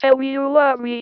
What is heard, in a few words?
Female vocals and robo-vox